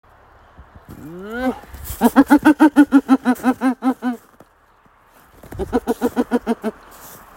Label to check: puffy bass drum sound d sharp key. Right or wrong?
Wrong